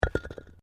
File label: PAVING STONE FALL